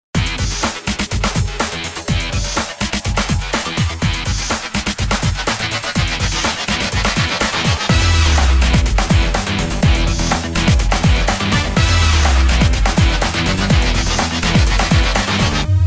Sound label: Electro; Deal or No Deal; Wii